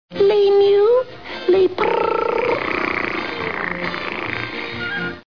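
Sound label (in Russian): человек имитирует мяуканье и мурлыканье кота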